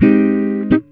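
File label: guitar chord funk; Univers Sons; Jazzistic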